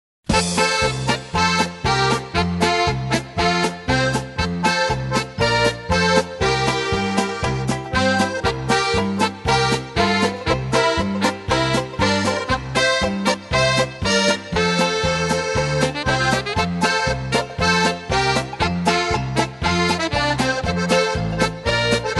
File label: Polka